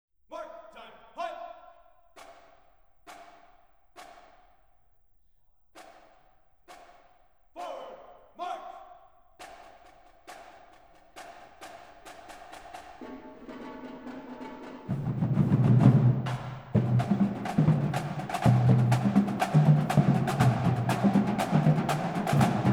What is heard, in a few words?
Marching Band Test; World Music; Isomike Super Test 2008A; Audiophile Sound Italia